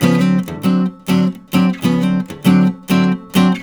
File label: RIFFS, Blues Guitar; ILIO; Fretworks